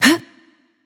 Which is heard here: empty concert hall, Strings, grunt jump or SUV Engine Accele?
grunt jump